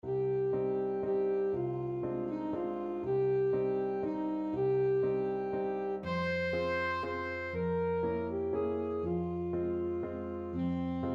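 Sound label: waltz, alto saxophone; grieg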